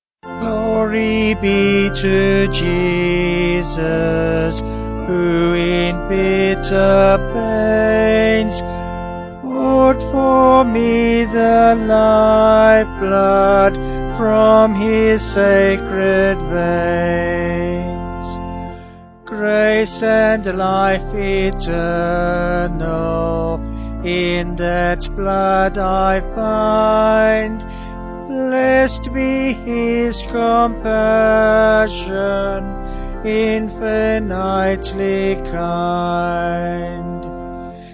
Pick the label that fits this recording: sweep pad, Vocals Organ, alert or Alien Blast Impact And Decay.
Vocals Organ